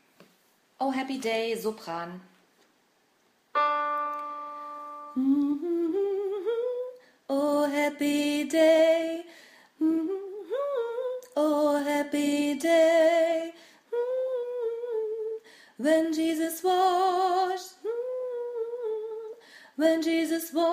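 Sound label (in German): Oh Happy Day Sopran, gospel choir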